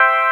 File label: chord